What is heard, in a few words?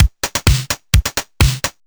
Housebeats 128BPM; Vengeance Samples